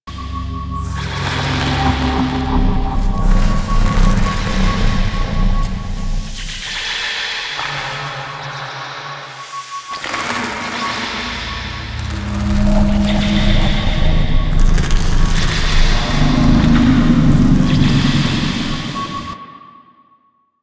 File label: Interior Orokin Loop, Ambience; Warframe; Infested Eris